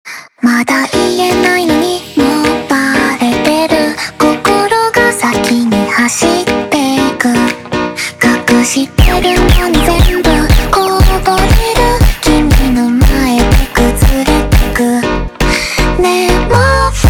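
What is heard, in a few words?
slowed, phonk music; funk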